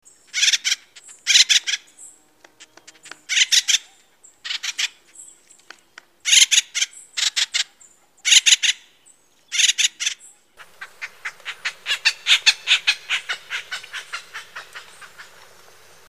Acorn Woodpecker

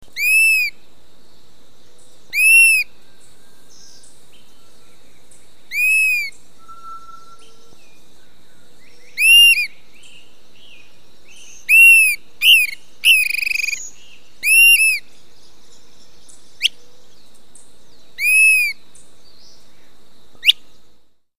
Dusky-capped Flycatcher

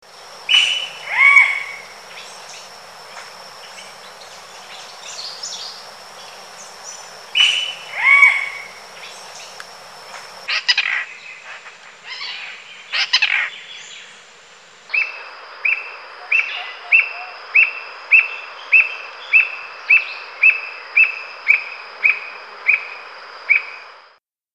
Squirrel Cuckoo

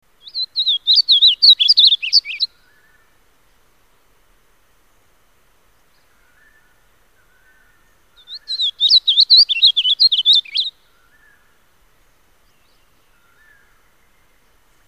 Gray-crowned yellowthroat

